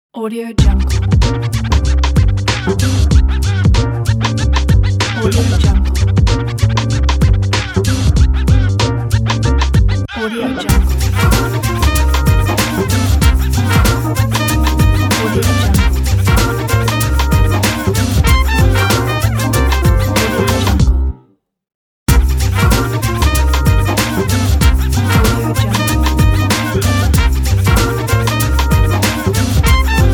acid jazz hop logo

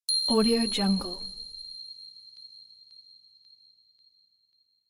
bells sound

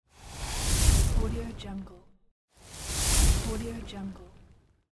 bellows heavy pumping

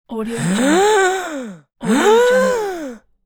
gasps

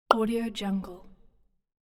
single pop

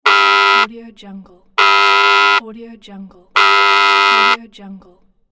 wrong answer alarm buzzer; volkovsound

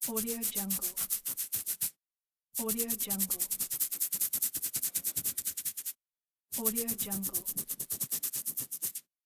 the body itch scratching

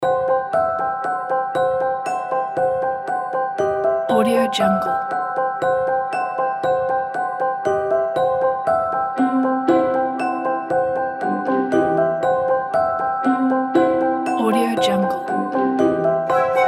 magic beautiful orchestral

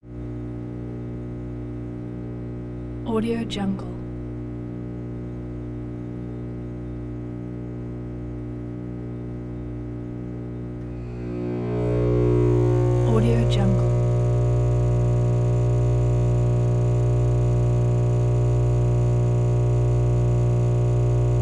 transformer hum

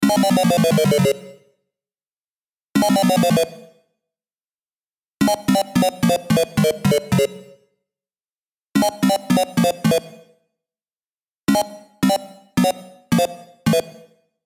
game over

